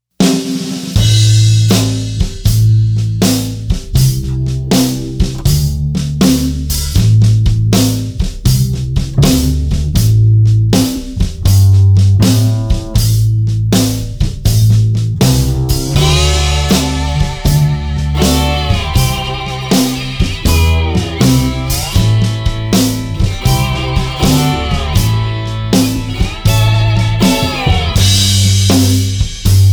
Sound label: Bottleneck Underscore 120